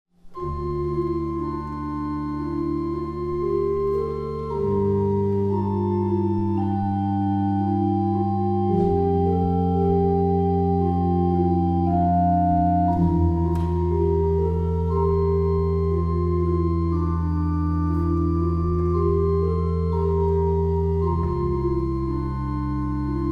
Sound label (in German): cantus firmus kombination orgel inselkirche; Algorithmische Kompositionen; kramann